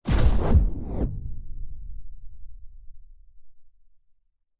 science fiction weapon incoming bullets hit impact whoosh; zapsplat